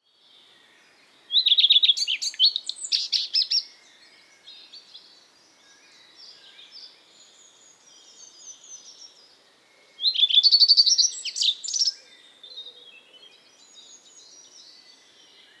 Redstart

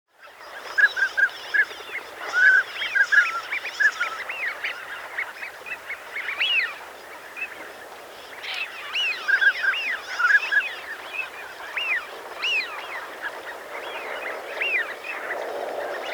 Pintail

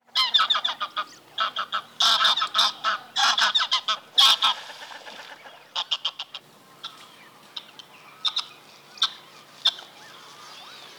Greylag Goose

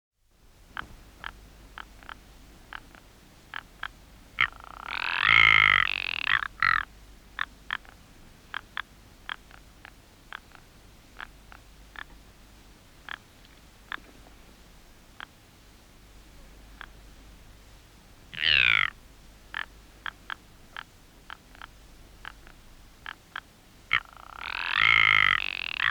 Ptarmigan

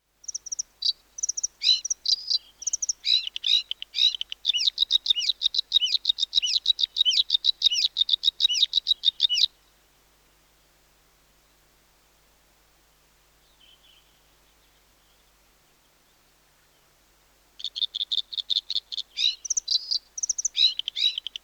Bluethroat

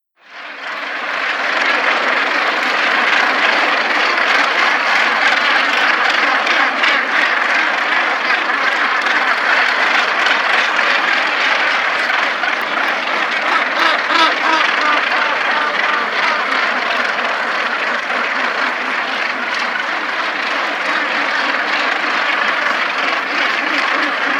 Gannet

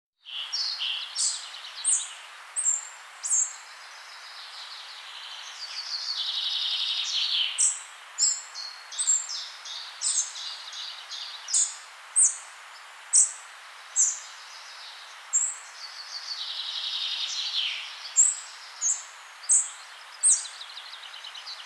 Spotted Flycatcher, birdsong

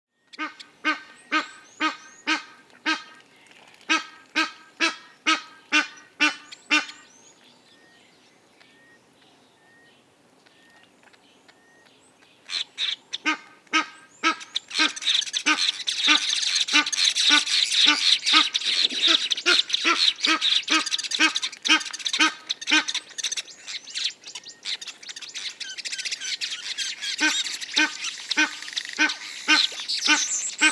Egyptian Goose

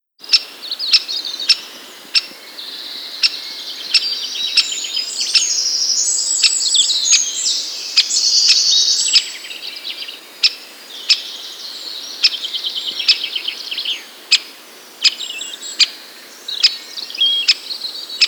Great Spotted Woodpecker

